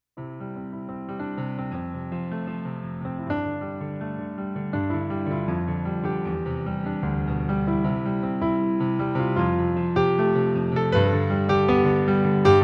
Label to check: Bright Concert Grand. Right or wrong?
Right